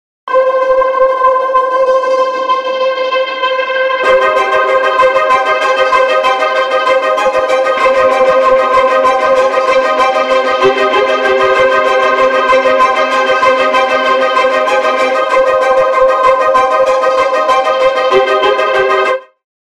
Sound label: Triplet All Highs